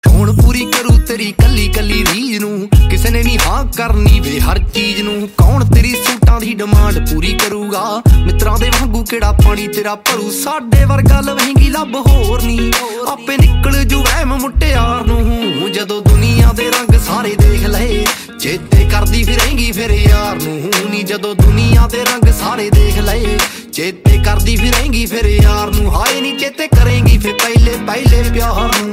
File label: PUNJABI SONG RINGTONE